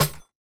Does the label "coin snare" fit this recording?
Yes